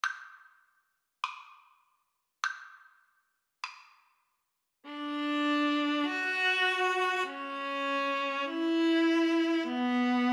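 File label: sonata violas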